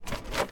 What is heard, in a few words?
Photocopier Lid Open